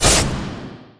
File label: attack; spectre